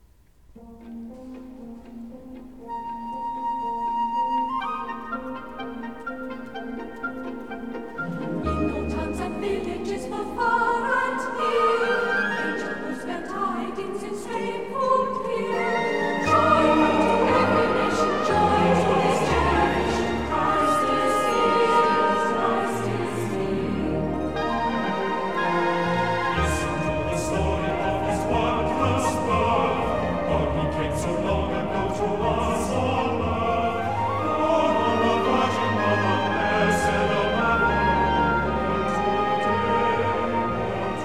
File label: traditional moravian carol; angel tidings; arr. rutter